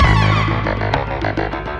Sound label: keys, Phonky loops